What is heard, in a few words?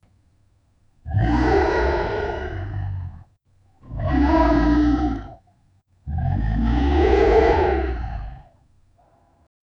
wolf